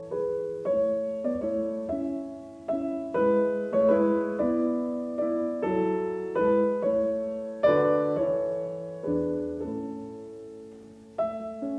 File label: Piano Sonata No 11 in A major K 331 I Theme and Variations Andante grazioso; Lili Kraus